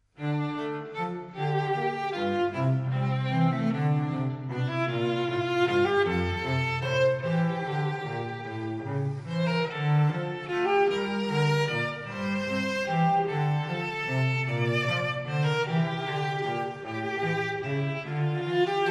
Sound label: Valse du Moulin